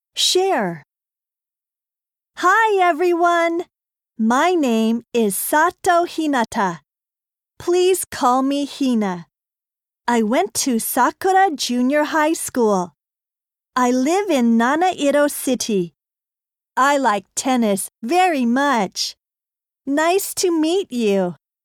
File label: harmony; 新課程英語教科書 指導用音声CD